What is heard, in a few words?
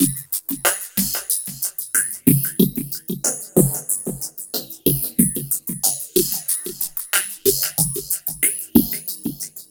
RING HOP, 60-110 BPM; Spectrasonic Distorted Reality 1